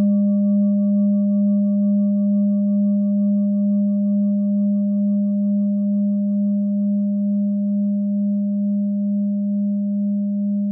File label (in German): klangschale set; Klangschale Nr.2; Gewicht = 1380g; Durchmesser = 20,5cm; Höhe = 9,8cm